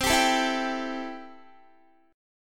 C 6th C down Guitar; strummed

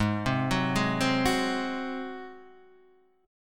G sharp 7th G sharp down Guitar Standard; G#7; arpeggiated; JGuitar

